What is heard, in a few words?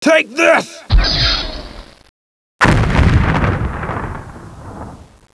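sword fight; X-COM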